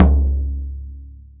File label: arabic perc, Arabic Percussion Kit